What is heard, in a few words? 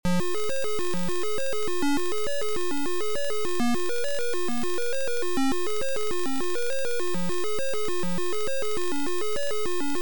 Guitar Arp